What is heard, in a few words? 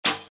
Arrow released